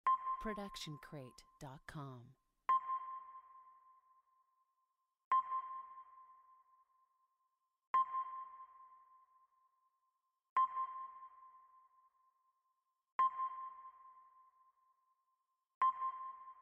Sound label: sonar ping 8 times; soundscrate